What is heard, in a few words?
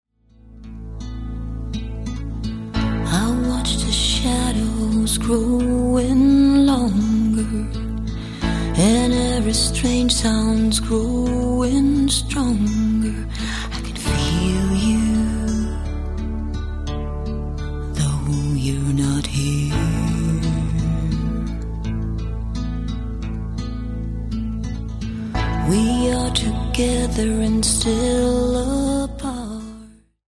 lullaby